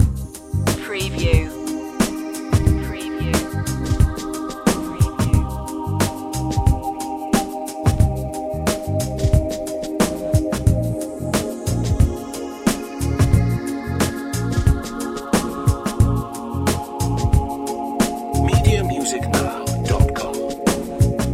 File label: smooth waves loop